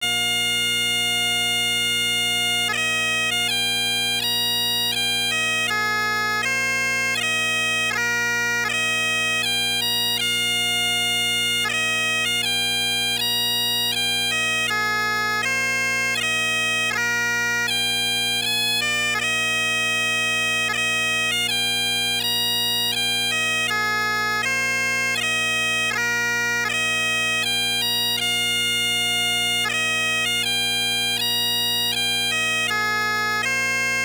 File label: 80 bpm, pipe band; Castle Dangerous